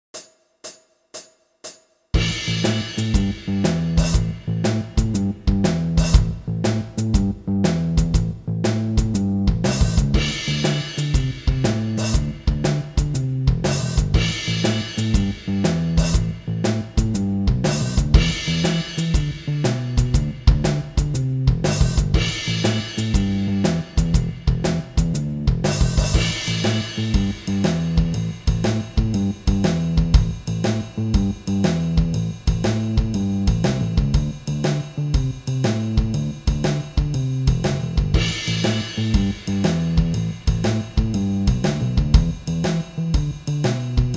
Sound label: Basic Blues Licks 120 BPM